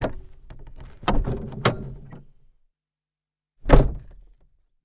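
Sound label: Carriage Door Interior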